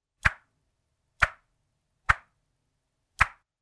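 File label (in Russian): звук рассекания воздуха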